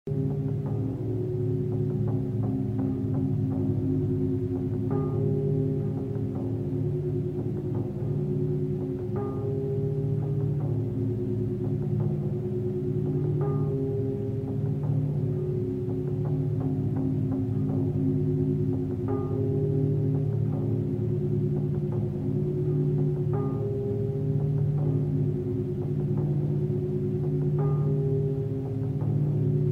Custom Night Drone; Five Nights at Treasure Island Anniversary Edition

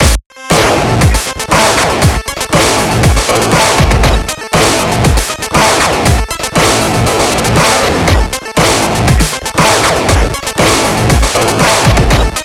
boss GUITAR

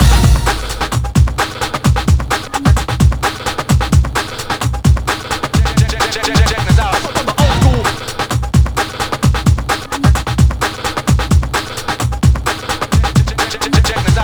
Pluck; Jet Grind Radio; Dreamcast

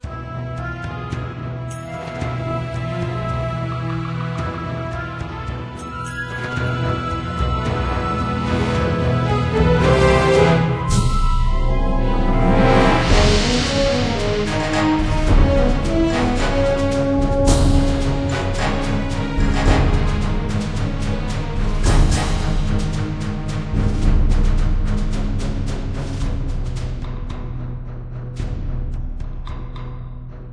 Menu Music

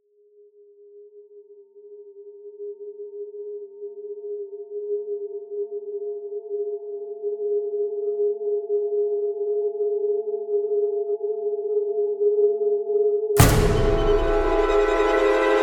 Music; Emergency 5

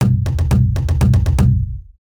Level Start; Yoshi's Island DS

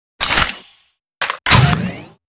reload

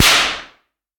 slap

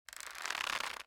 bowstring

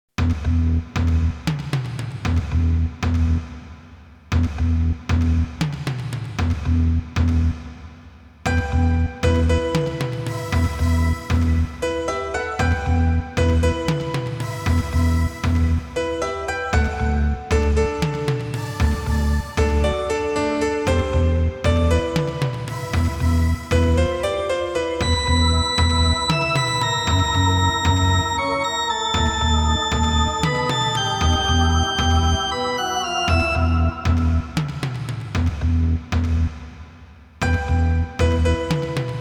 Sad Song; Dragon Ball Z; Kakusei-hen

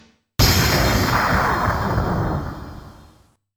Game Over; Ragnagard; Frustration

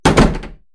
Door Close Wooden Door Internal Shut

